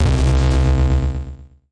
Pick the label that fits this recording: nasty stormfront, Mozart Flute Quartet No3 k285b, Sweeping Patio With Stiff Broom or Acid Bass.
nasty stormfront